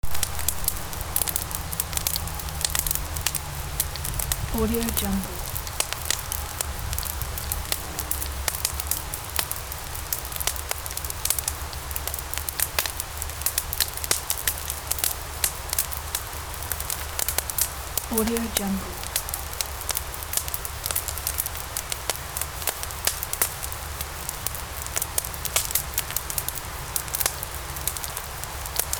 fire; سوختن آتش کمپ; nature sounds

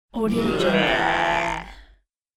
mixed group disgusted

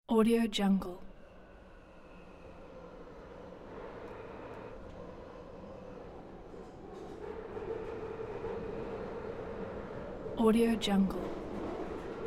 london tube ride interior

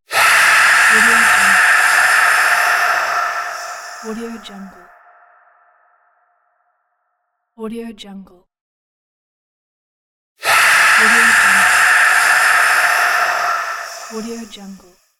breath of doom; نفس های آخر به سختی; game

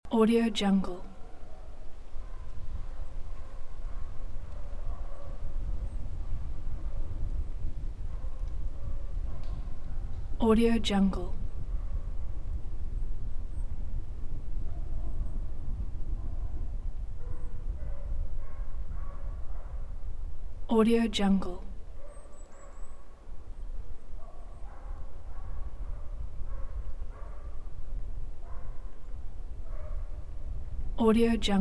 windy winter woods near village loop

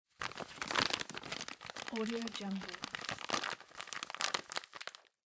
yerba mate bag opening; domestic sounds

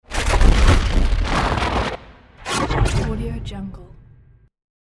space transformation; transitions and movement

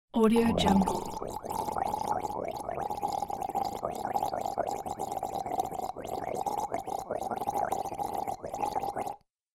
washing sick throat; human sounds; غرغره کردن و شستن گلو

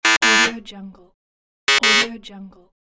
wrong answer buzz; interface sounds

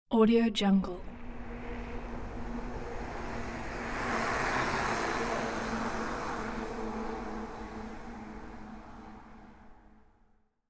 speedy bicycle pass by

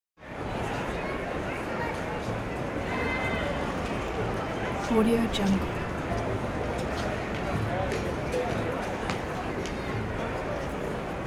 stadium crowd ambience; human sounds

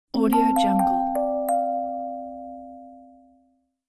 kalimba message notification; interface sounds

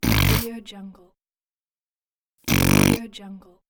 human nose snort; خروپف; human sounds

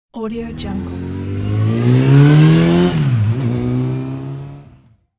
fast sport motorbike drive away

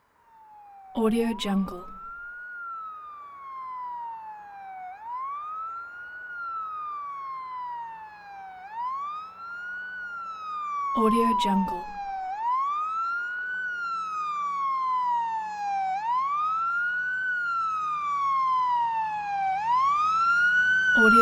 police fire ambulance siren exterior small town; urban sounds

